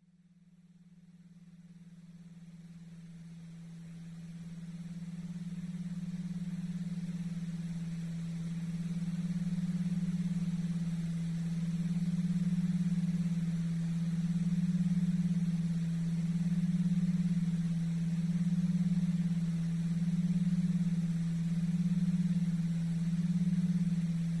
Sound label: Emotive Brainwave Hypnosis 432; quantum mind method